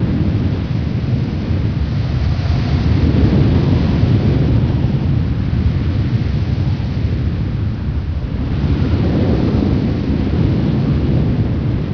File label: wind rooftop, ambient wind; Source Engine; video game